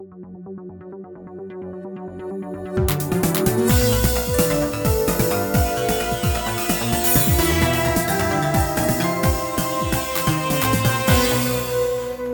Intruder Confirmed; gun point; Crosswire (Unused)

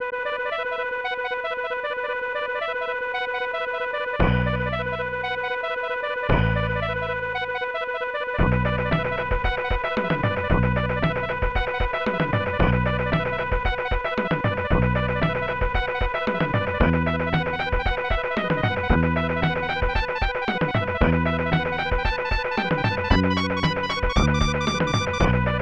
Stage 1 Boss (Hard); Gourmet Sentai Bara Yarou; SNES

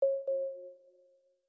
Knock Notification; Do Not Feed the Monkeys

